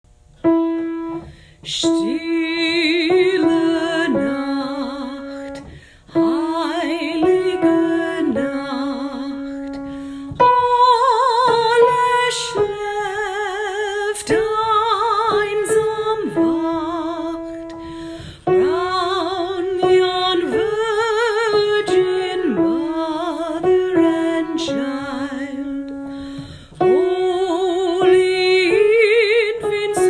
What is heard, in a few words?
Silent night sop